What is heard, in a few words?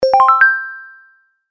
kirarin